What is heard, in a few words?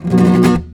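RASGAND C major, FLAMENCO Guitars; Spectrasonics; Hans Zimmer Guitars VOL-2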